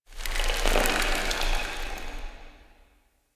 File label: Infested Dust Fall, Ambience; Warframe; Corpus Infested